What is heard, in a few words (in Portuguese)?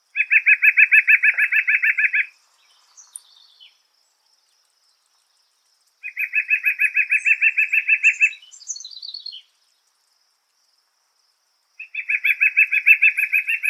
Canto do Pica Pau do Peito Branco